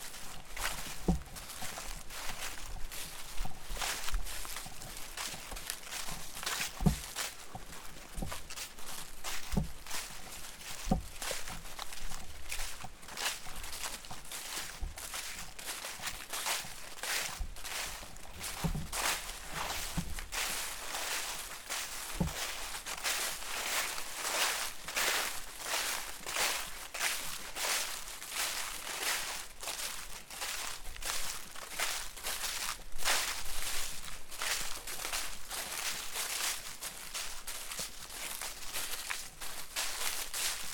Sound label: Reedbeds Path; Newburgh